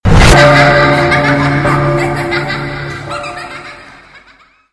laugh

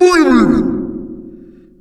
halloween boo

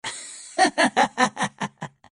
laugh happy; Adachi

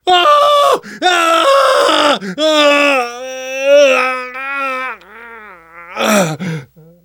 death scream; Bill

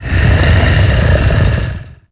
alien idle; xenomorphs

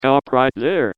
pain severe; pyro; johnmadden

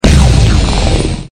slash low; NMH

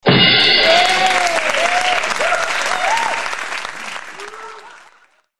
bash

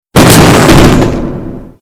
fireworks spark; tf

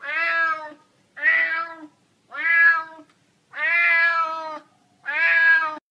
cat

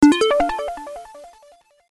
mystery pickup

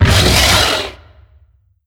baron roar, baron of hell; doom4

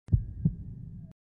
slow beat; dod

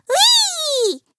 weeee; hat kid; a hat in time

